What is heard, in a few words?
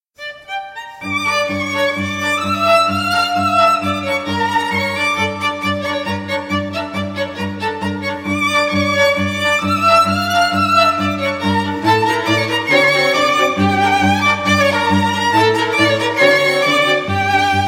the old klezmer band